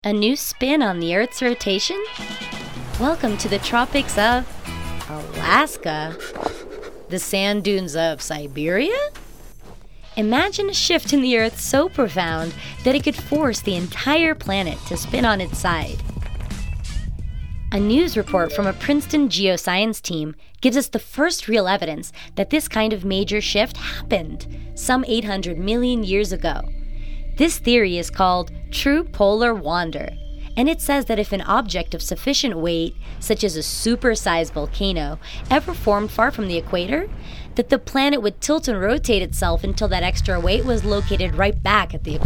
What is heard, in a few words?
Earth Tilt Documentary music effects